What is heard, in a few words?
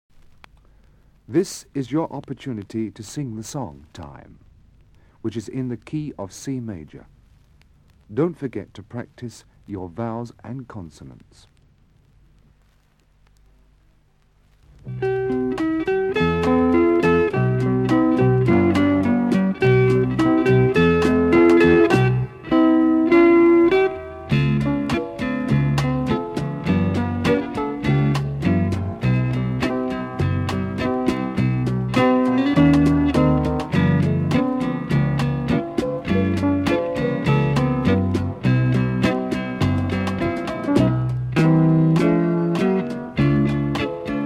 Backing Track